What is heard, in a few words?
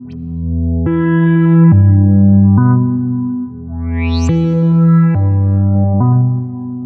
Riddim Melodic Loop E 140; Ghosthack; Riddim Essentials Volume 2